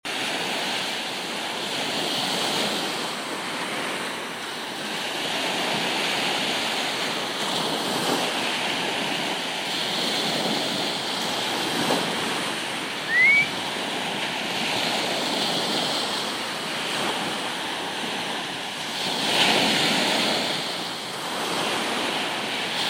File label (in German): Meeresrauschen